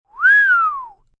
Whistle Relief, Whistle, whew, relief (human voice); Whistling Sound Effects; Whistling (Sound Effects:Voice); VoiceBox; Twistedtracks